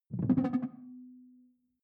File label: sci-fi alien bounce; swooshes-swishes